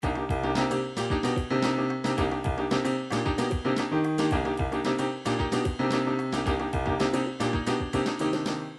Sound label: triphop; Newtronic Triphop Construction Kit